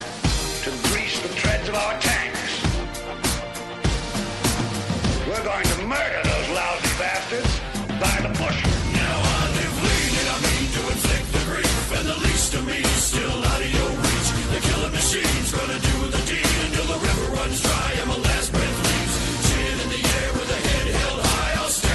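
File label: best new zealand ringtone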